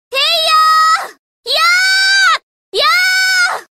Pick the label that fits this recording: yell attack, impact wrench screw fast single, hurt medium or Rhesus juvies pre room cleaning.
yell attack